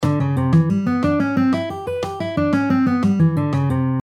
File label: C Major 7 Double from Above